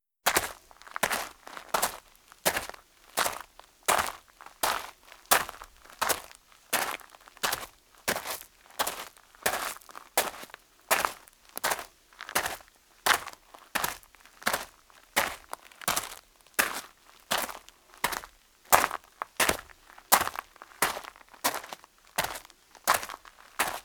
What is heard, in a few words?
Footsteps Gravel Male; Inquisitor 2